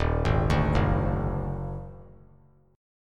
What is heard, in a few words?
D sharp Minor Major 7th Double Flat 5th D sharp; arpeggiated